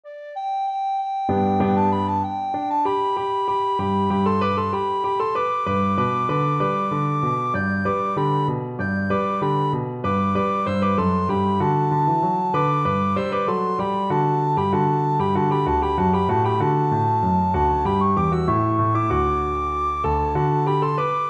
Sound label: recorder